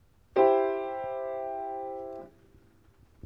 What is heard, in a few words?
piano mi so do